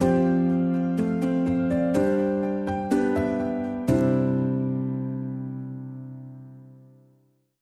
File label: Bumper